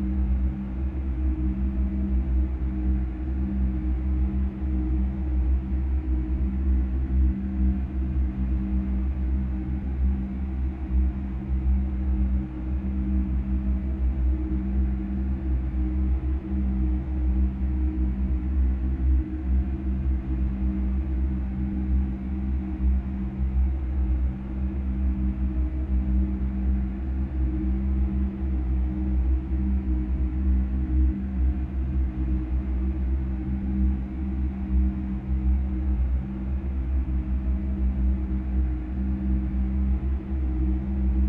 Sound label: quarantine default, music ambience; The Swapper